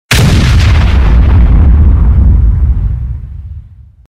150mm Gun shot

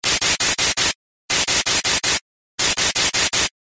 White Noise

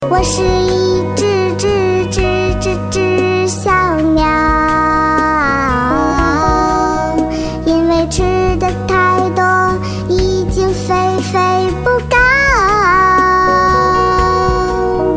cute chinese baby

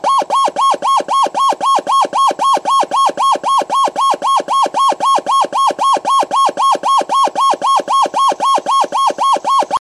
Siren

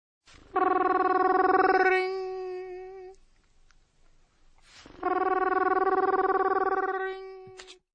rrring

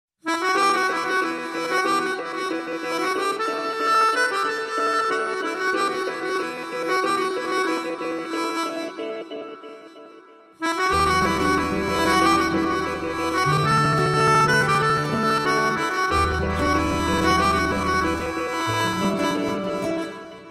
Flute

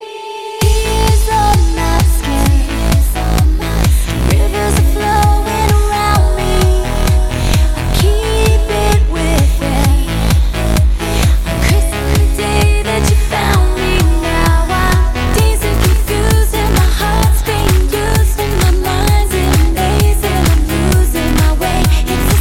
tear drops on violin

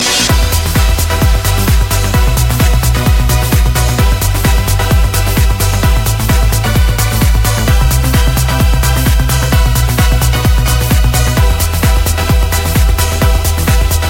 trance ringtone

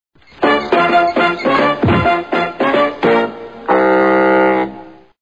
cartoon exit cue

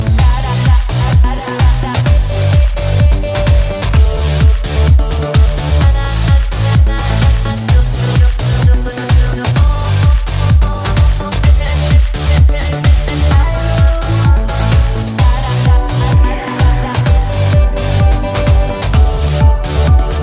Electro

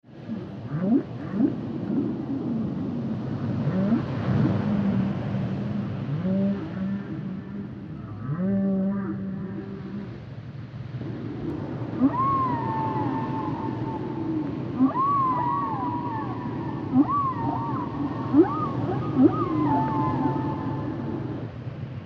real humpbacks; animals

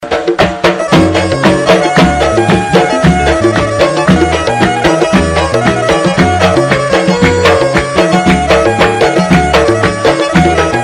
irani music

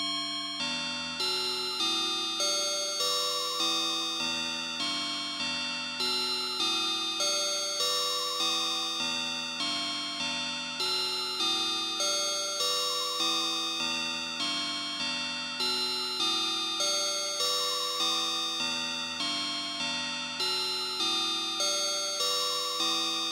Bells Ringtone

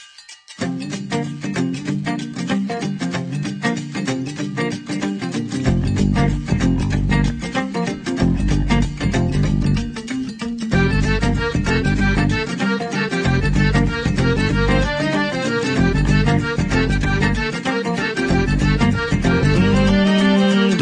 New Tune Shuddh Desi

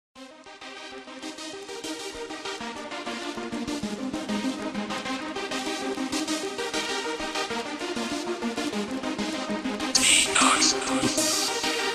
hip hop club

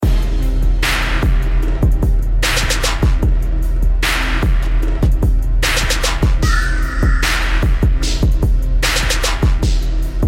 Urban Deep Beat

